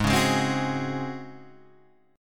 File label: C sharp Major 7th Suspended 4th G sharp